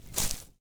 Footstep Grass Medium; Footsteps

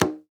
Buffalo Drum, World Percussion; Cymatics; PHARAOH Beta Pack; Pharaoh Premium Drum Samples (BETA)

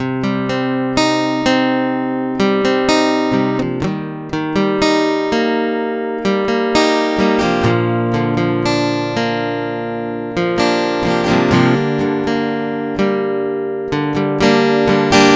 Guitar 125 BPM (C Minor), Acoustic Guitar; Wonderland; GhostHack; Free Guitar And Piano Samples

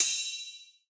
Bright Ride; House; Drum One Shots; Cymbals; Cymatics

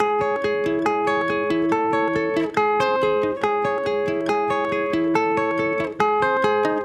Guitar Loop 140 BPM C# Min, Dry; Melodics; Instrument Loops; Guitars; Cymatics; Cobra Hip Hop Sample Pack

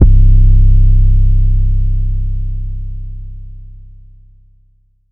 808 Halves (C); One Shots; Cymatics; DOPE DRUMS VOL. 1

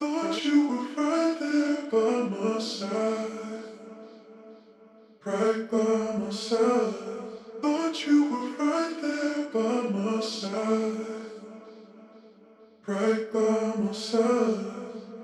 Vocal Loop, 126 BPM A Min; By My Side; Vocal Loops

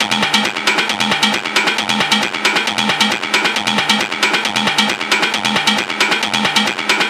Perc Loop 135, Techno

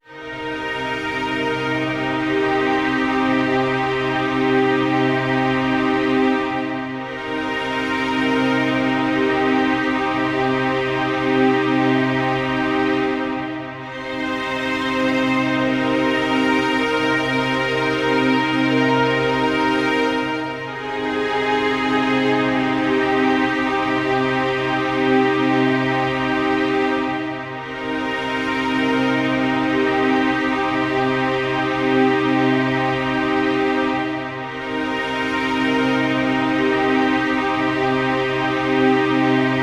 Low Strings - Cm 70Bpm; Construction Kits; STEMS; Ghosthack; SkyRealm; Kit 19 - Ode To Daenerys

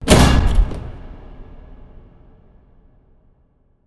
impact hatch; Hits

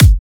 Dubstep Kick; Drums & Percs; Ghosthack

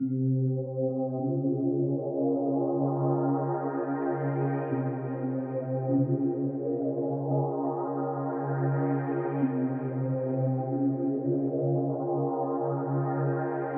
West Coast Melody Loop - 102 BPM C Min Pad; Melodies; Loop Stems; Cymatics; Oracle Sample Pack

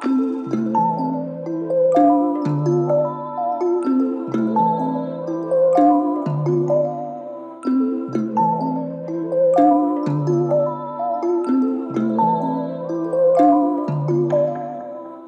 126 A Min; Gems Vol 1; Purple Dreams; Cymatics; Gems Free Teaser Pack

